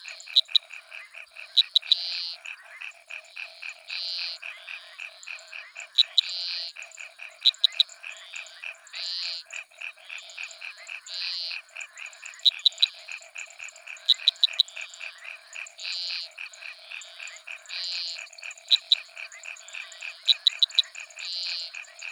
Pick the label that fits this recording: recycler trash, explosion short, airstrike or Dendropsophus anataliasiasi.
Dendropsophus anataliasiasi